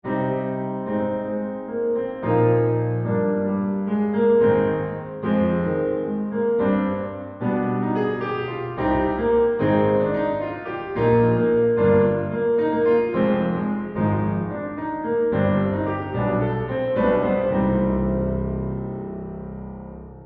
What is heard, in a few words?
piano wet